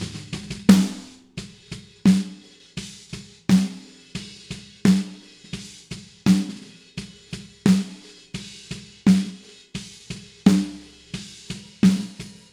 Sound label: Snare Group Dry